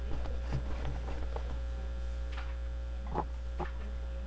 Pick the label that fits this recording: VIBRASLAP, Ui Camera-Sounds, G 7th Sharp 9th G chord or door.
door